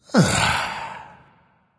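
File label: nonverbal content; smite; voicepack; ullr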